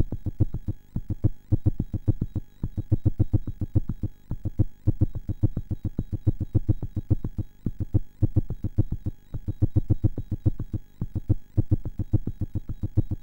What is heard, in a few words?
sid, MOS6581R4